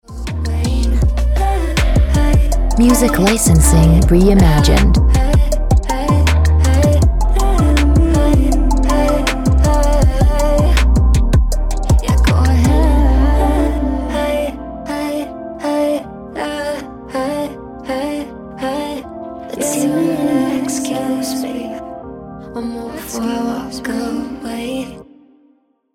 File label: Lie Ringtone